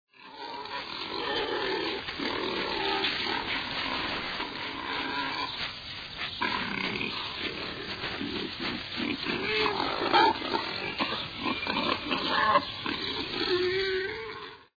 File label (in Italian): cinghiale